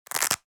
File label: Velcro wallet open; purse